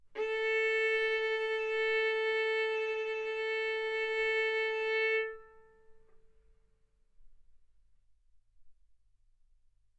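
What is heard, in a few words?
Violin arco mf A string A4 B4 stereo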